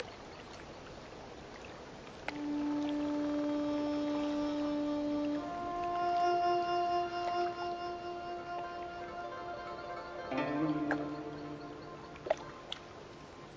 mooncake ambient

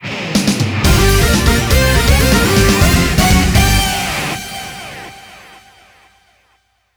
Jingle Win; Kamen Rider Dragon Knight; 2009 wii

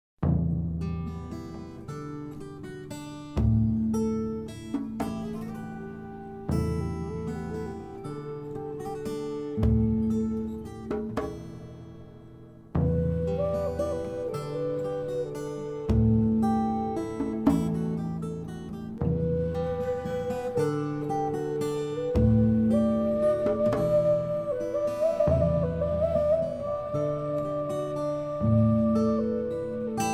explore day; faring

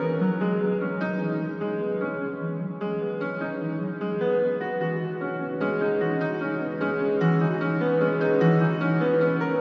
Piano Layer

